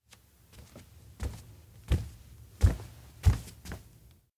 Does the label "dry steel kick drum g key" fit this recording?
No